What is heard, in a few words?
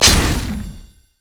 javelin toss oh; nidalee